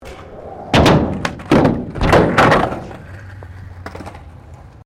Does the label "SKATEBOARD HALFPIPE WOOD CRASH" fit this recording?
Yes